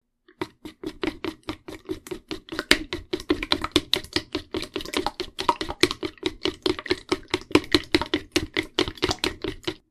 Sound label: egg mix chopsticks